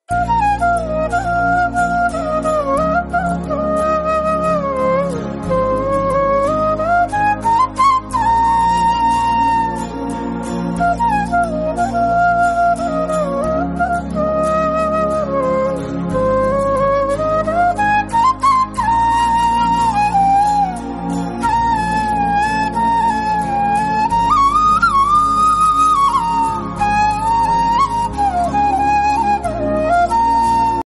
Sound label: instrumental bgm ringtone